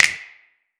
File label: Clap Reverb